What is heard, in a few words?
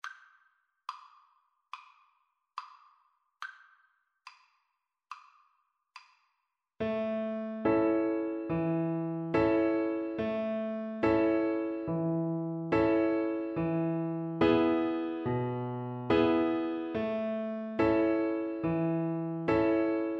big ship, Violin